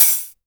hi hat open, Korg ER-1; 300 Drum Machines; Kit 6

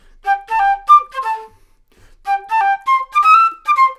flute, disco

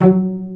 PIZZ CELLI; E-MU Formula 4000 Series Vol. 5; Protozoa; Proteus2 Instrmt

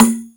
Percussion, Drum Machines E-MU Pro-Cussion

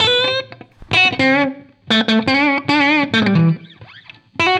BLUES GUITAR

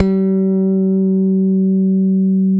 G#4, Bass, PRECISION BS; Spectrasonics; Bass Legends

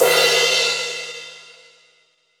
New Brush Crash, Cymbals; Roland BK-5

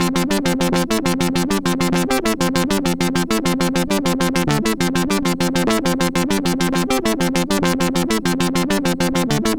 C64 Synth; 8-Bit; Chiptune; Commodore 64

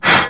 HUFF, Old World Instruments, Winds, Venu; E-MU Producer Series Vol. 7 – Old World Instruments

